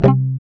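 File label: GUI C, acoustic guitar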